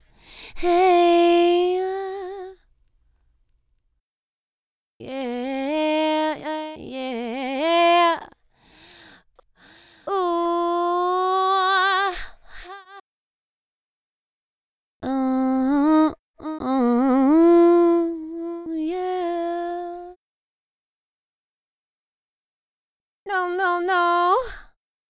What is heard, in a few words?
multiphonics detuner dry; multiphonics cv 2 audio fx; hugo leger